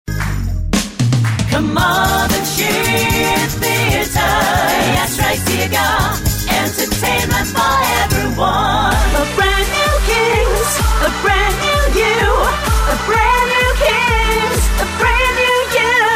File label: vocals